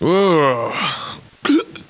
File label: drunk